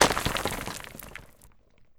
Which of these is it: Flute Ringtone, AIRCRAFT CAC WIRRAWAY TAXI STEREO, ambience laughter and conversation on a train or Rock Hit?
Rock Hit